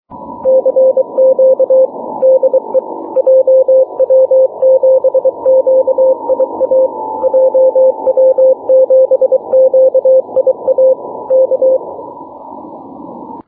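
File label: 6m continuous wave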